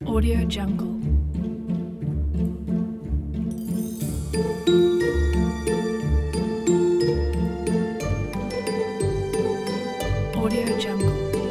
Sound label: magic forest; audiogem